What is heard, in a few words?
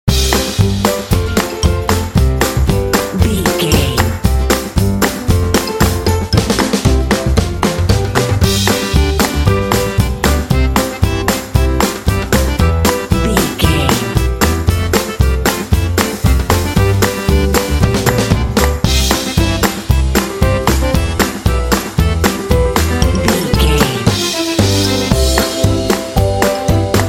polka comedy party